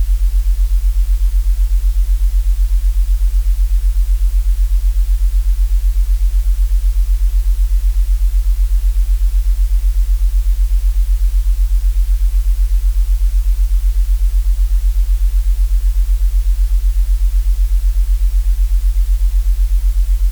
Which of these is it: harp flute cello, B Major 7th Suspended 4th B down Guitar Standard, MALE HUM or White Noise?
White Noise